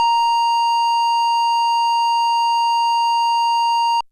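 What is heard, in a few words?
FM Sq Dist DX100 A#1; square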